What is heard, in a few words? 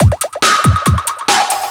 Breakbeat; Vengeance Samples; VEC2 Loops